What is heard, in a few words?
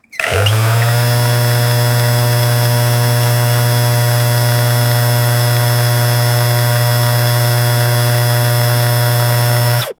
low pitch thyrystor elect